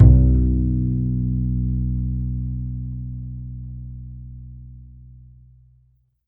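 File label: double bass B2; Univers Sons; Jazzistic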